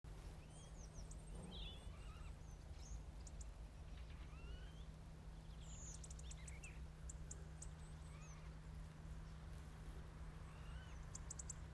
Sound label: Water rail and others